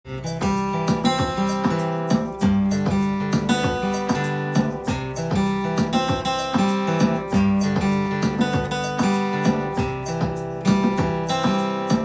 percussion and rhythm section

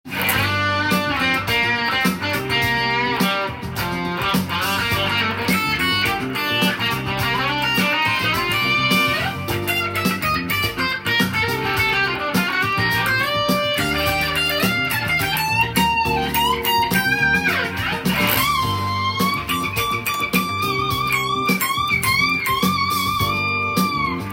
delay od, guitar; japanese-made guitar effects with multi-effector

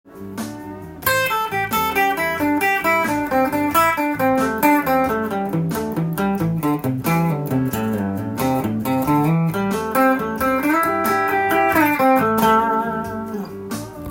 blues hane solo, guitar; blues guitar rhythm; blues guitar rhythm problems

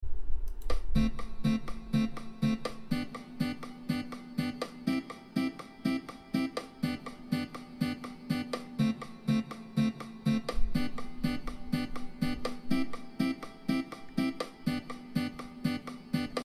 chord

